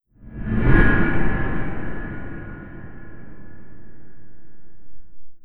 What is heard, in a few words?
D R O P S A M B